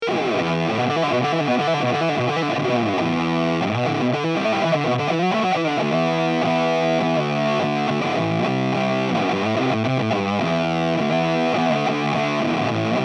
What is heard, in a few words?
Bridge Fuzz